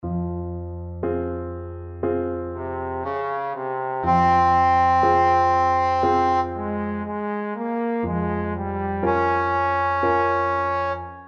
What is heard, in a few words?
czardas, trombone